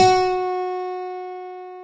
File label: lyra; theodyssey